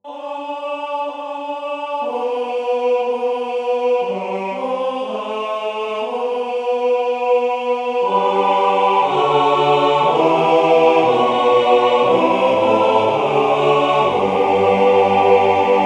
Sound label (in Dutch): 4 stemmig amen tenor